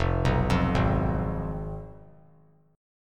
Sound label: F Diminished; Fdim arpeggiated; JGuitar